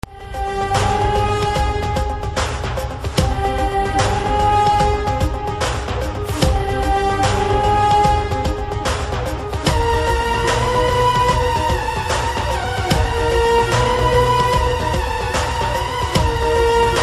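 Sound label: background music Telugu Ringtone; Nani; V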